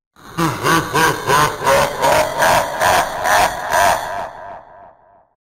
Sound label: laughing